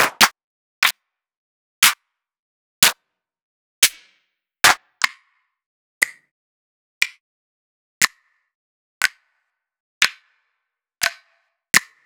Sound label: Claps And Snaps